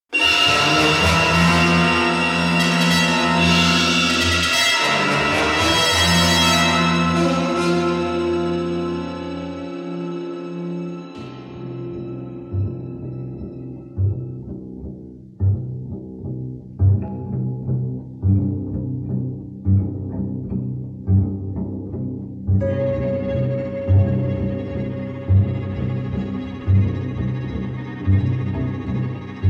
Attack At The Window, Shock-Stormy, Dramatic Swirl; Acoustic Space Station; Dramatic Swirl To Sting